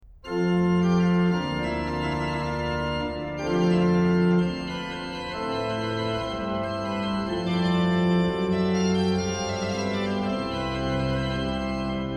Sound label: O Lamm Gottes, unschuldig, organ; BACH; BACH ORGAN WORKS; CHORALE PRELUDES